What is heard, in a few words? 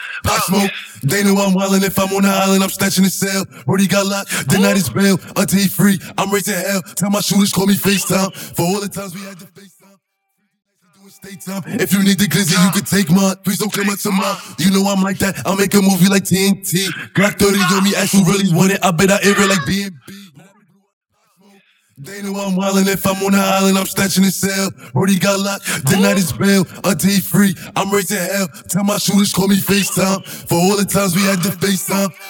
slow and original, Studio Acapella